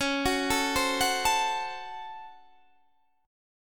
Db Major 7th Suspended 4th Sharp 5th Db Guitar Standard; 21,21,19,17,19,17; DbM7sus4#5 arpeggiated; JGuitar